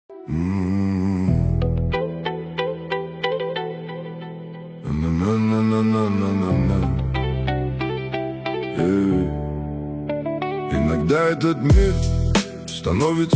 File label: deep atmos deep bass hip hop mix